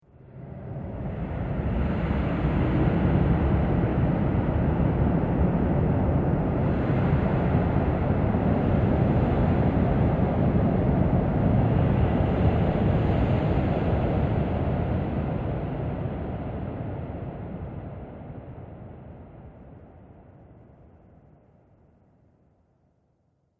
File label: Horror Scene Waves Of Darkness; Scary Soundscape Sound Effects